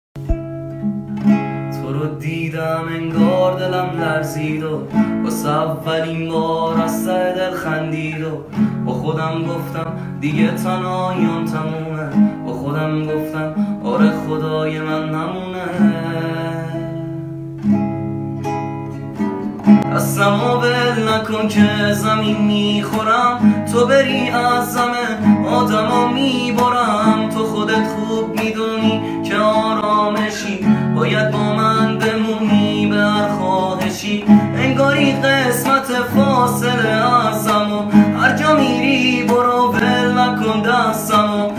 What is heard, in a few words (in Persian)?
Ba Guitar